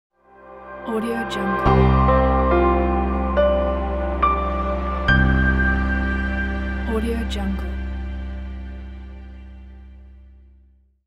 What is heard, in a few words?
piano and strings movie logo